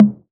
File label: Tom 606 G#3; One Shots; Drums